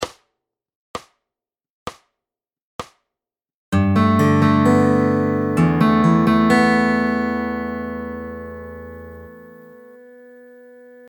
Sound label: Fingerstyle Chord Anchors